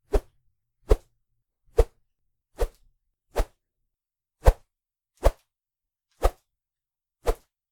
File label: Medium Stick Whooshes